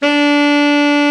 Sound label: BRA TENOR, SAX; Best Service Gigapack 1; BR SAX 2